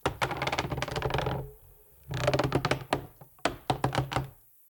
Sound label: Exterior Wood Creak; The Town; 3D Environment Clip